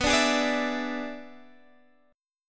E 7th B, guitar

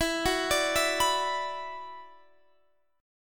E 7th Suspended 2nd E down Guitar Standard; E7sus2; arpeggiated

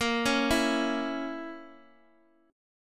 Bb Diminished Bb Guitar Half Step Down

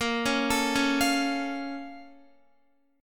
Bb Minor Major 7th, Guitar; x,13,13,14,13,13; arpeggiated; 4F,4A,5C,5G,5C,6E; chord shape

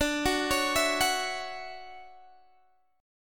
D-Major 9th, D, Guitar, Open D

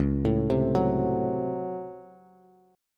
Db Major 7th Suspended 4th Sharp 5th Db Bass; 9,12,10,11; arpeggiated; chord shape

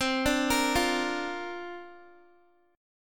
C 7th Suspended 2nd Suspended 4th C down Guitar; C7sus2sus4 arpeggiated

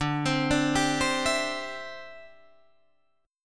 D 9th Suspended 4th D down Guitar DADGAD

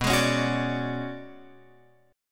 B Minor 6th Add 9th B, Guitar Open F; x,6,8,8,9,9; Bm6add9 strummed; Bm6add9 chord; JGuitar

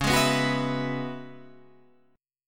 Db Minor 11th Db down Guitar Standard; strummed

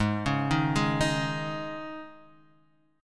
C sharp Suspended 2nd, chord

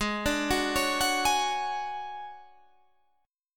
D Major 7th Flat 5th G sharp, chord

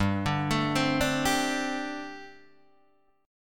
G Suspended 2nd Suspended 4th, Guitar DADGAD

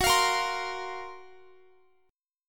Gb Major Sharp 11th, Guitar; GbM#11 strummed

